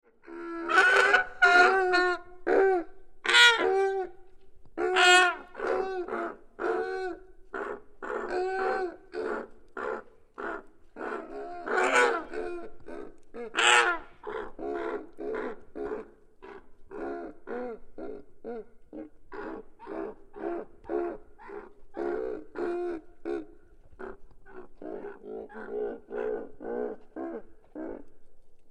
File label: Geese, Various Squawking; Animals